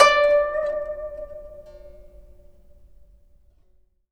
GUCHIN D4; INIS; Opium

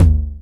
Tom 909 Lo; Multisamples; Digital Sound Factory Planet Earth

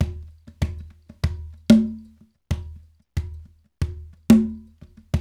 World Dance

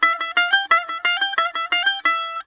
18O, Mediteranean Traditions; USB Soundscan vol.53; AKAI

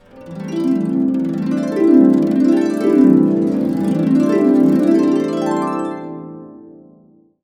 C CASC UP DN, Harps

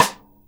Jazz kit rim, Drums Acoustic; AKAI; JAZZKIT 2; 90s sample CD; USB Soundscan vol.10

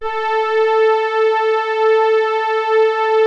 A4, ANALOG PAD; POLARIS; Music Store - Claudius Diemeter Schwerlos

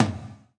GATE TOM, Drums Acoustic

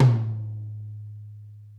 tom; Sonic Reality; Interactive Drum Kits; 90s sample cds

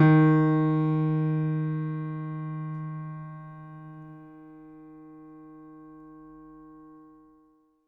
MIX MONO, Acoustic Piano; InVision Interactive; Miroslav Vitous

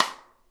SNARE; Sonic Reality; Interactive Drum Kits

